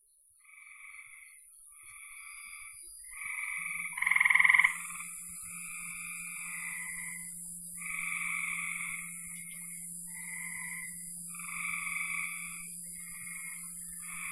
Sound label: frogs; Frogs and Crickets